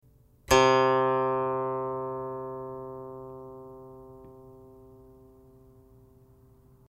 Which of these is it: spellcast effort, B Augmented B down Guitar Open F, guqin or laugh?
guqin